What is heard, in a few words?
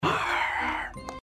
harharhar